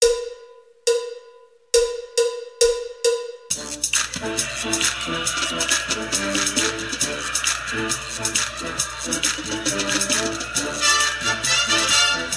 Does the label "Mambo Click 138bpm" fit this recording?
Yes